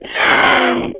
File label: zombie death; cstrike; jailbreak